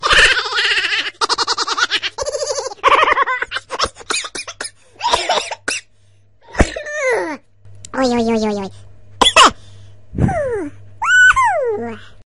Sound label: funny laughing sound ringtone